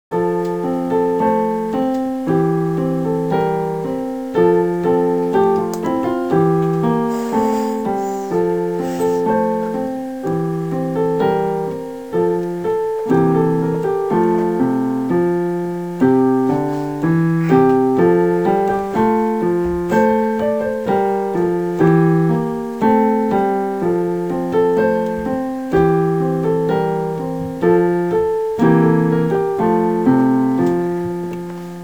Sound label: komoriuta